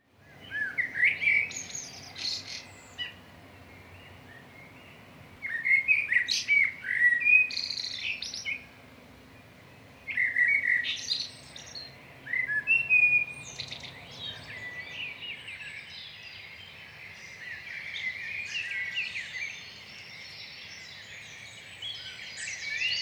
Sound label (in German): vogelgezwitscher